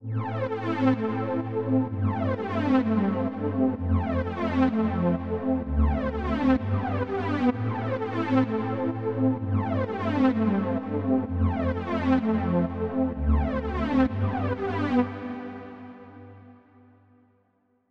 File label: Notice Pad Root A, 128BPM; Vengeance Samples; Notice Kit